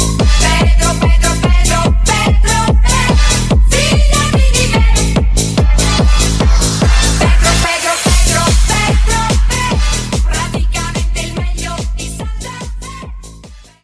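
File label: 145bpm E minor; Club Mix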